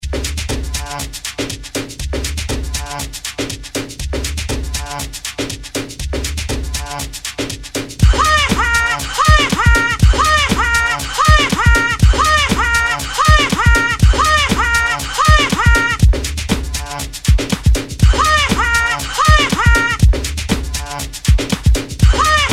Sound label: ha ha remix